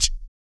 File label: BOOGAROLL, Vocal Blues Soul